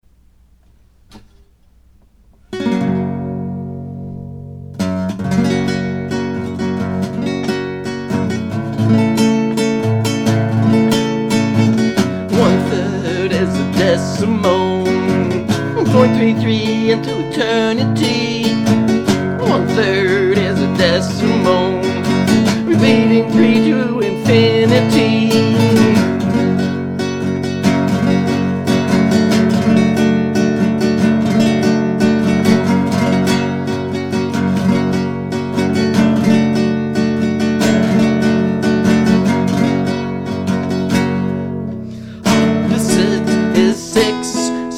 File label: Soundcheck; One Third